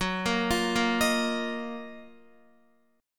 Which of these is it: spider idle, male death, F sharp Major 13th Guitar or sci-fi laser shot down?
F sharp Major 13th Guitar